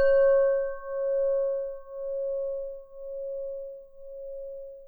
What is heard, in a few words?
bell